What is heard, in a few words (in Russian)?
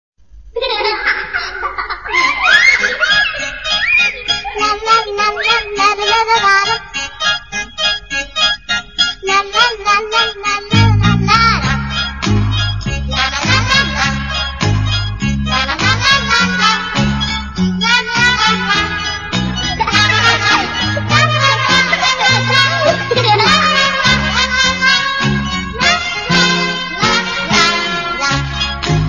smeh